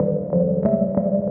gamelan, guitars; Big Fish Audio - Alien Guitars; FULL LIFE

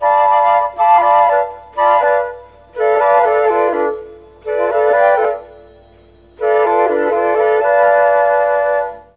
A#3 CHA FLT, Mellotron; Mike Pinder - Mellotron Archive CD

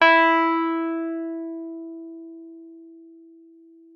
WUFF E4, WURLITZER; Xsample VOL-1

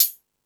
High Hat, Drum Machines

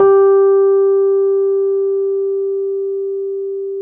RHODES EP G3; Sonic Reality - Vintage Timetraveler

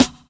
SD, Dance Mega Drums; Best Service; SD-HIP

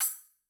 Splash, Cymbal; Yamaha DTXpress-II

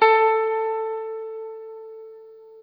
Guitar & Bass; Quantum Leap; 90's Sample CDs

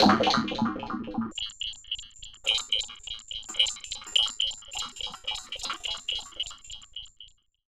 Ring Mod, Cymbal, contact mic; Murf & Ring Mod

